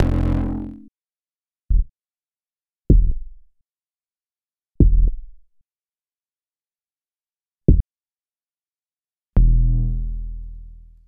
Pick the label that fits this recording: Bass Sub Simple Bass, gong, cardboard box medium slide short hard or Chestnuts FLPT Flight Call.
Bass Sub Simple Bass